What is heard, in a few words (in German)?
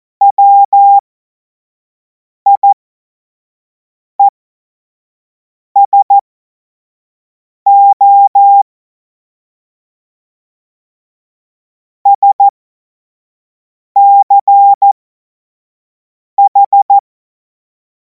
Witz, Morsekurs, cw morsen; Tempo 50 F70; hamradio